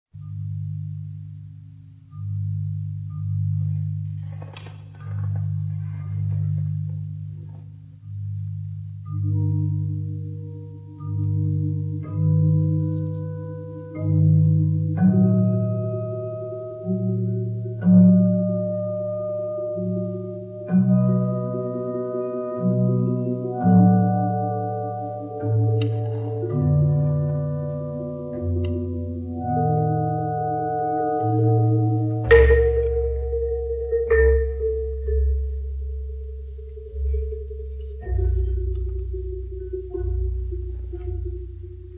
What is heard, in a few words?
bass marimba